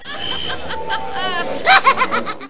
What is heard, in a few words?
Jabba Creature